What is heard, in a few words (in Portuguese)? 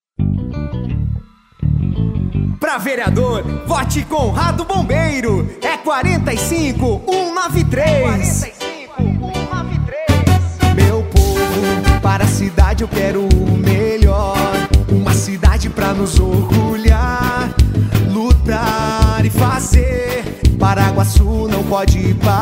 VEREADOR GOSPEL; Conrado Bombeiro 45193